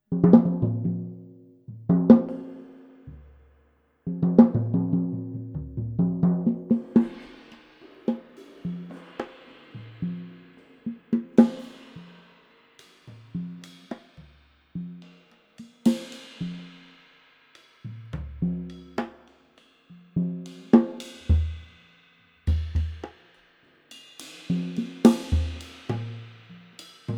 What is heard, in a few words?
The drum begins